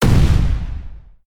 firestorm hit; Idle Champions of the Forgotten Realms

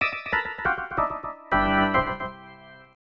Dunk Contest - Failure; dunk mania; namco system 11 arcade

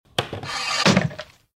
Giljotin Sound

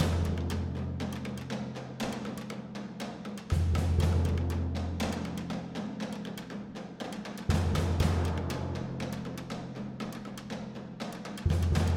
Enemy Fight Stem; beast quest; ps4; Rocky Valley

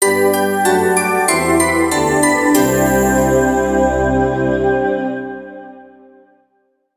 JINGLE GETUP; Puppies 3D; Nintendo 3DS

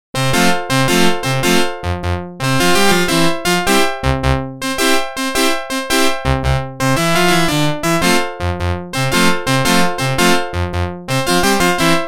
Organ; NHL 94

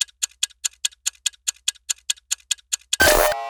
Computer Error; LVL The End; backrooms 2d android ios online windows gamerip

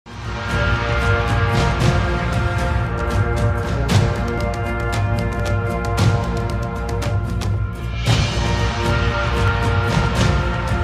Grassland Battle Music; ARK Survival Evolved

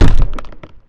Cube Fall On Conveyer; 7 Wonders of the Ancient World

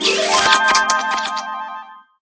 chocolate box large

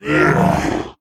Block UV Light; Devour; The Town

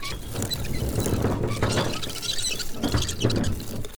Mining Cart Loop; doodle jump 2; android

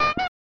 Erase (Clear); mario paint game rip

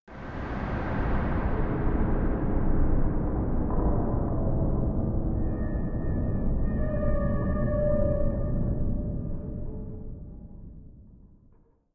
stinger; HDF Ch2 WelltoFoyer; Hunted The Demon's Forge; 2011 pc

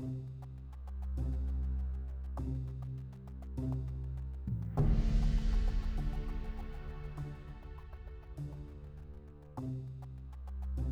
Quest Base Loop; bakugan champions of vestroia 2020; switch gamerip

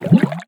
Object Exit Water

